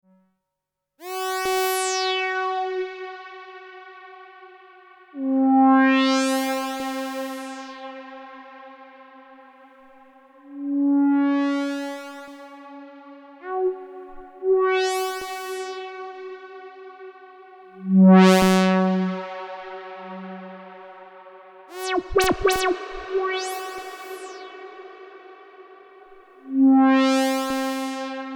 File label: Moog Mother 32 Patch Generative